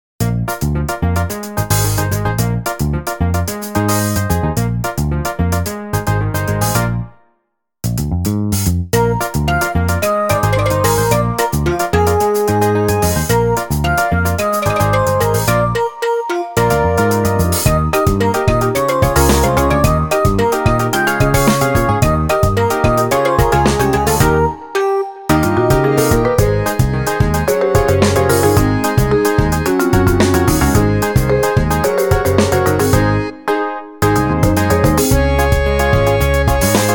Comical Samba; Your Diary; sound diary CD

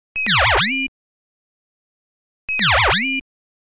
Combine Parts

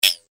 Raw Metallic Tick

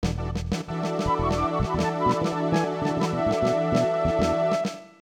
Hang Glider Landing Accomplished

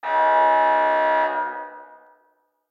Buzz; hidden deep windows gamerip 2022

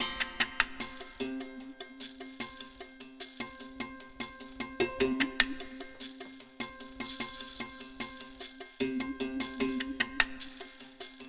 Plucked Pitched Percussion; Valley

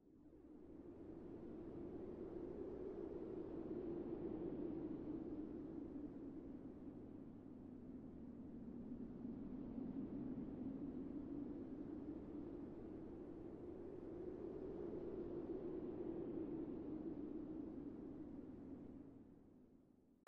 Intro Ambience; Five Nights at Wario's 1

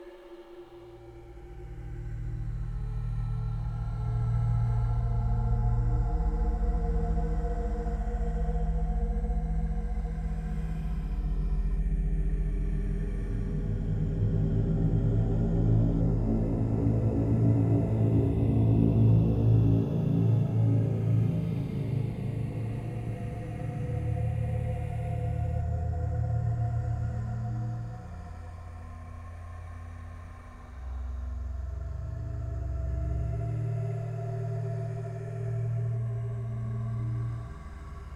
sun drone

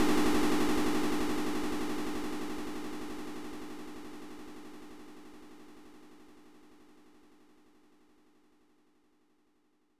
Rock Fall Danger; Pooyan; Family Computer

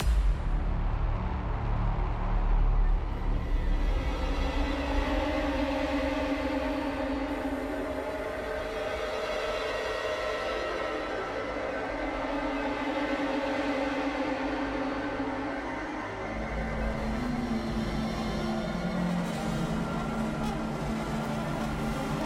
Boss Main Music Loop

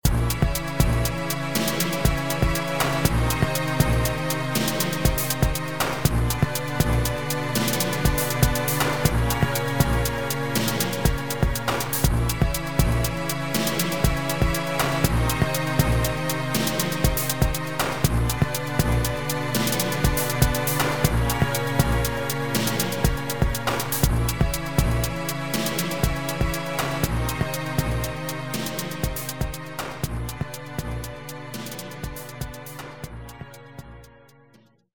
Enemy Missle Launch; Enemy Missle Launch 2 (Asteroid Belt); Star Fox Command

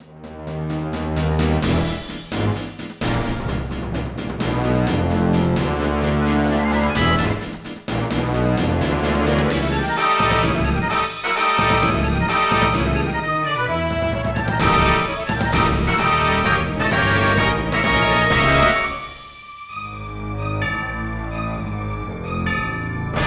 Battle Theme

